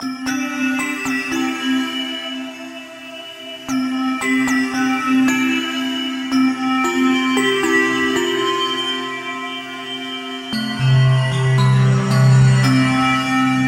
thin drifting gamelan fair

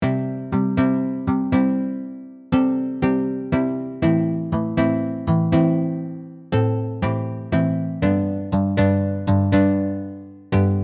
picky git

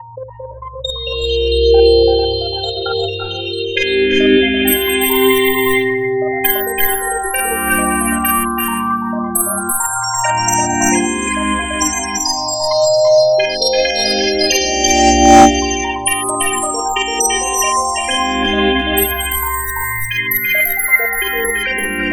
pad gravitational waves

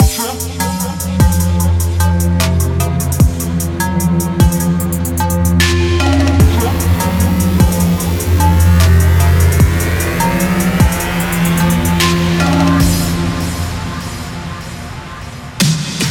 trap monsters kit

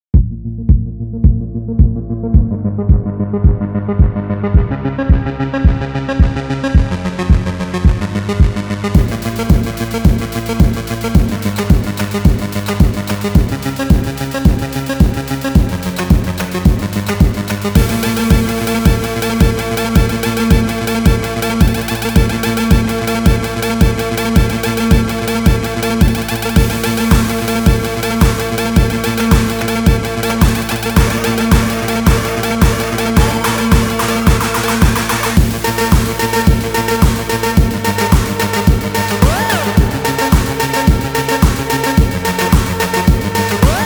8 bit generator

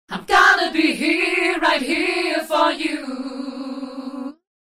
detuning voice vocals wet

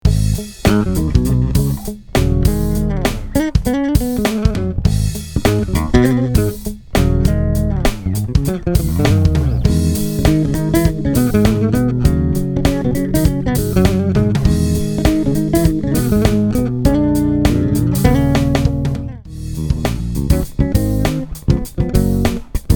bass

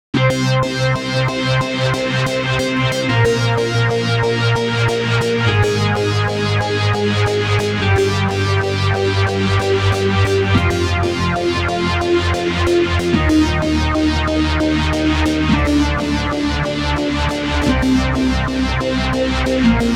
rythmic noise; aludra

